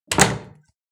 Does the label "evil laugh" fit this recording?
No